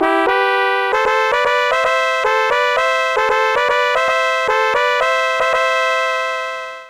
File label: fanfare; Sonix Music Driver